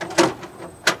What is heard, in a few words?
Humvee Hood Open; Trucks